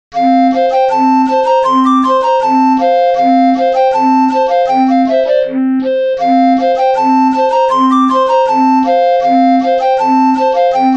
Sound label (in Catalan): Ball Ingles vell (flabiol + bombo); festa